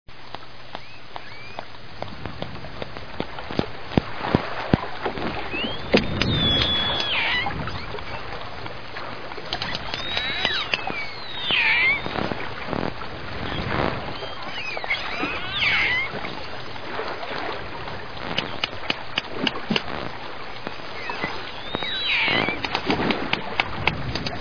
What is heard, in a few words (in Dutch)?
potvis; walvisstrandingen